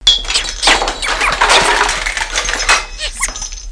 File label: CRASH BE; Internet Pratique 01